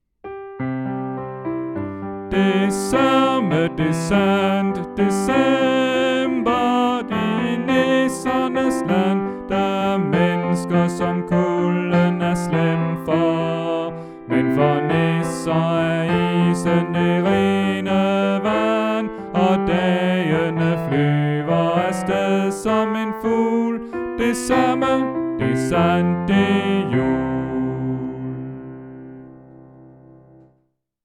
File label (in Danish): Bas; oevefiler